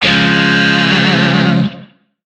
Shawzin Phrygian Chord; Warframe; Lizzie Shawzin

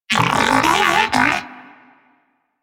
Moa Pet enemy spotted; Warframe; Tenacious

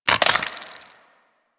Mech; Uzi; Warframe; Infested

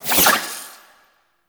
Mote Pick Up; Tau; Tau Focus Ultimates

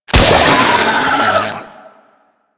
Shoulder Destroy; Enemies; Infested; Warframe; Femur

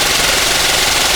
Ship Turret Projectile; Warframe; Weapons; Archwing; Fomorian

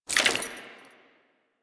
Generic Foley; Warframe; Warframes; Dagath

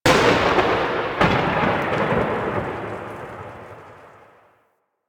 Void Storm Thunder Clap; Warframe

